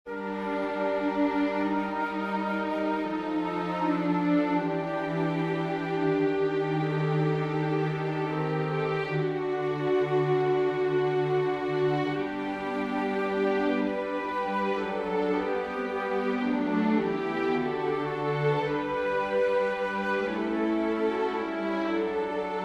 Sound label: for winds strings; purcell henry; departing thus thus you hear